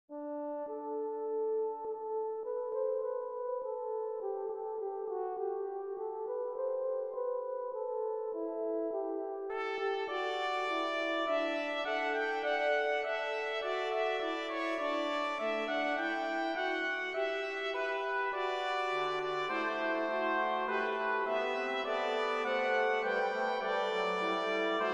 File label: Brass; brass quintet